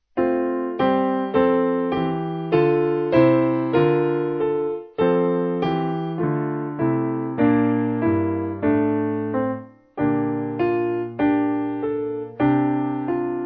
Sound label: Mainz Piano; At The Cross Her Station